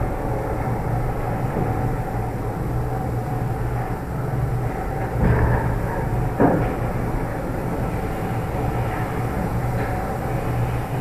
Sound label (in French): Ascenseur slow